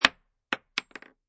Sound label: Bullet Shell Drop Machine Gun Solid Wood; Machine gun empty shells fall on solid wood; Lynne Music